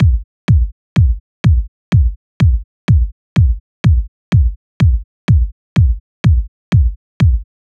House 125 Kick; Drums; Loops; Drum Construction Kits; Ghosthack; Drum Mega Bundle; Drum Hero 5

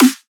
Riddim Snare; One-Shots; Ghosthack; Riddim Essentials Volume 2

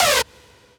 Techno Synth Shot G; Synth One-Shots; Ghosthack; 45 Free Techno Synth Shots 2025

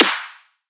Clap

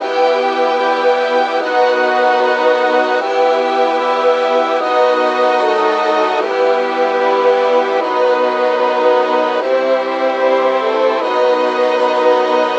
Melody E 150, Hip Hop and Trap; Vintage; Ghosthack

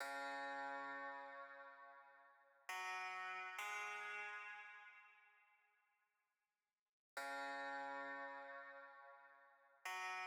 134 BPM C# Min Strings; World; Loop Stems; Cymatics; Senses

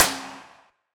Snare, Dubstep Snares

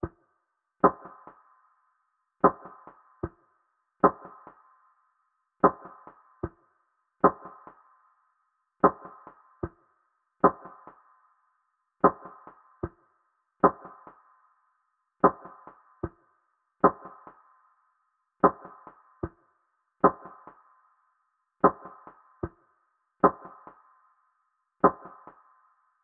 75 BPM G Min Percs; Melody Loops; Pistachio; Cymatics; ANALOG EVOLUTION Beta; Lofi Collection

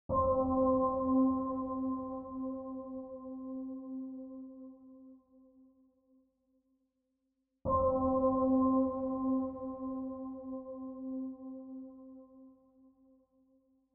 127 BPM F Min Synth; Trap Melody Loops; Loop Stems; Cymatics; Nightmares; Major

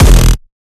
kick oneshot lilmonster F; GhostHack

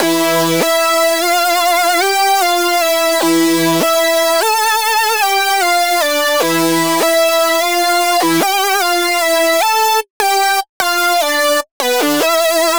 Lead Loop E, 150bpm; Dubstep; Alive; Ghosthack; Dubstep Sound Arsenal

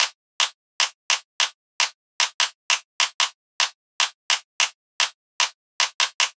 Clap Loop, Dubstep Percussion Foley 150bpm; Percussion Foley Loops; Ghosthack; Dubstep Sound Arsenal

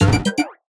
Retro FX; Cymatics; Video Game Sample Pack Vol 1

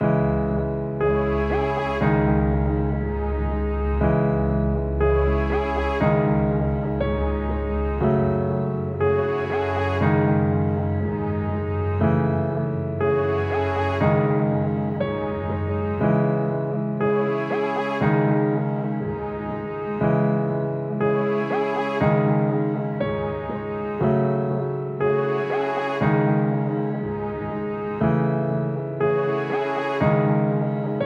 120 BPM C# Min, Melodies; Hail The Queen; Cymatics; Immortal Production Suite Beta

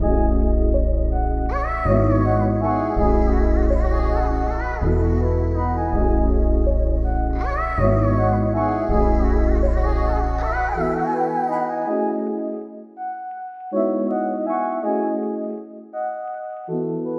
162 BPM C# Min, RnB; Goddess

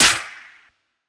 Snare, Dubstep Snares; GhostHack

